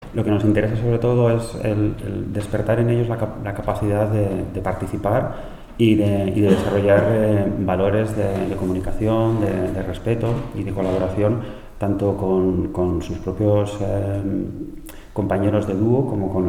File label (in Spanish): piano a cuatro manos